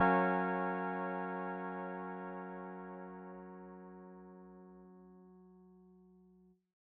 Keys G flat maj; Rmi Keys

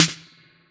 snare

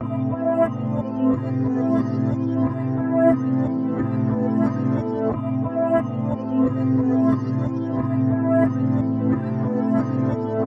90, A, Atmos; Lo Down

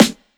Oldschool Snare

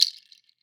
percussion ceremony

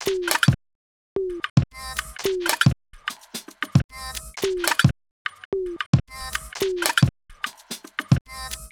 110 loop drums percussion

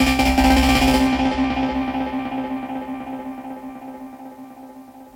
Synths One Shots Tape Synth

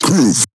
100 Groove

